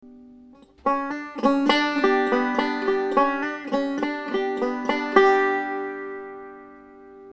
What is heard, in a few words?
roll